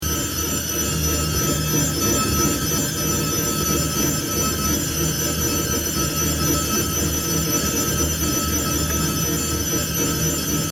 shimmer

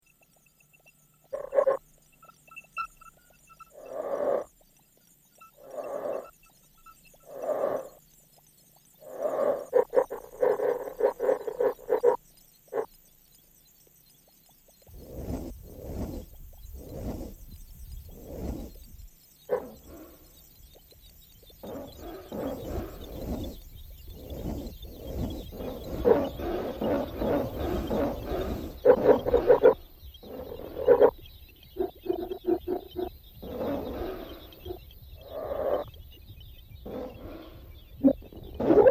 Dogs bark

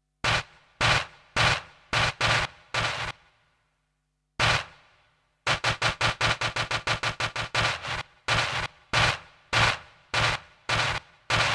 flam

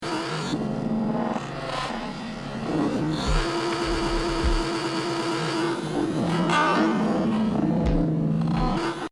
Loop stutters

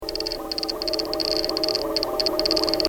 insect buzz

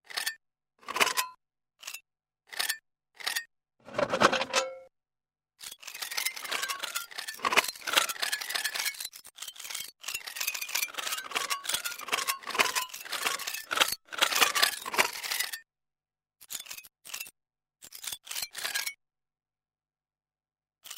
screw ant

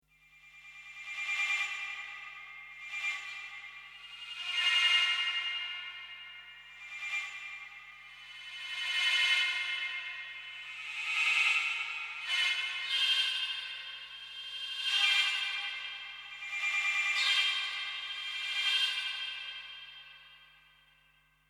Distant piano tinkle

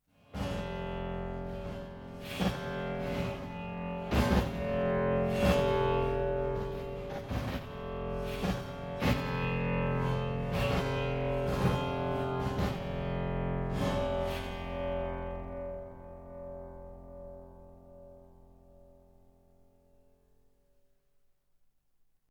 resonating buzz

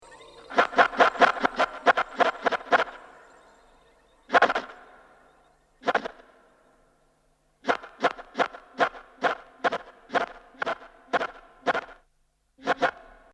flam

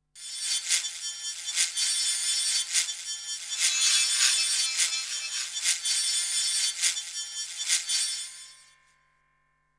Surface sizzle

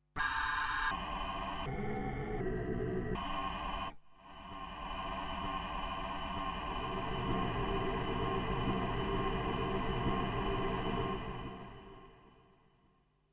night factory

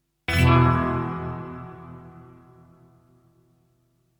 strum

